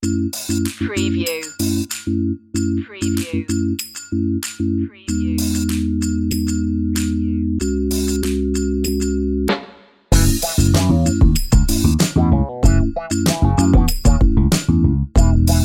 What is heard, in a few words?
funky and soul